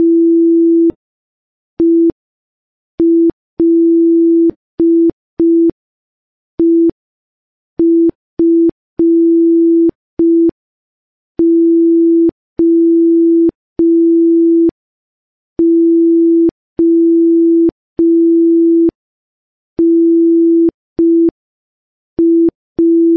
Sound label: morse code